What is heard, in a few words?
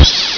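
alien grunt fire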